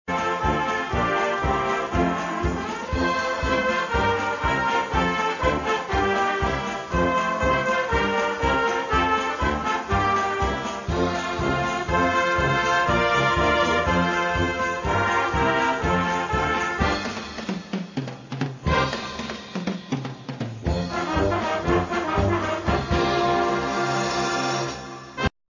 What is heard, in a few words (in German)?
Weihnachtslied Medley